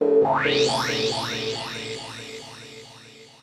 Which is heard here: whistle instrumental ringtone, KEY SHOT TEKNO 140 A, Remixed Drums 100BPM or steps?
KEY SHOT TEKNO 140 A